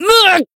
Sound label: damaged, jp; dunarith